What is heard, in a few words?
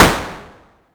Snare Modular, drums